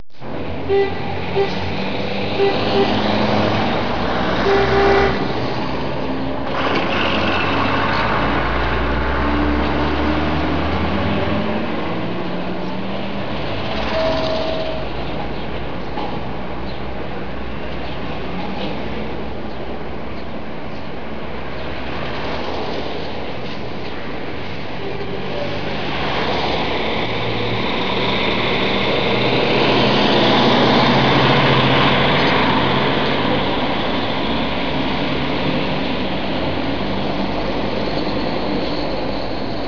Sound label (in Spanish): CALLE; El Juego De La Oca Loca